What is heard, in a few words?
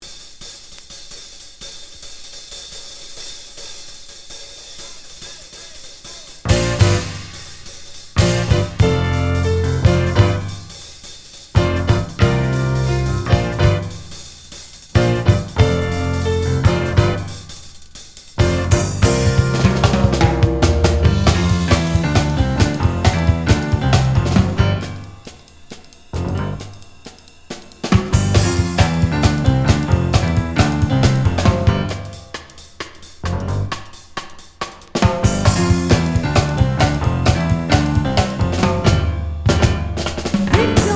Swing